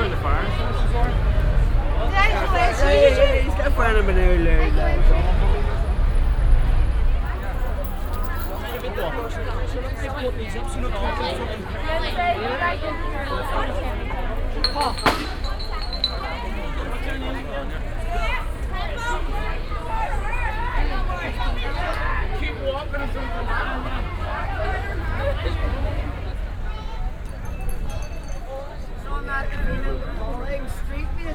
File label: Belfast Streets At Night; Sound Scapes